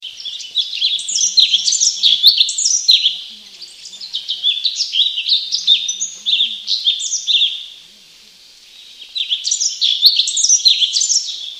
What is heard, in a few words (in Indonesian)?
Suara Cucak Cungkok; untuk Masteran Terbaru